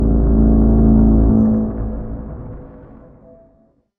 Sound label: ocean dock waves